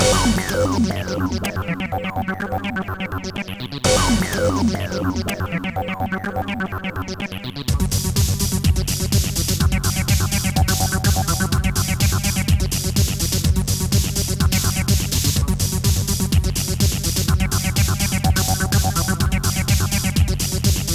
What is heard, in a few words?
mod techno; KEFRENS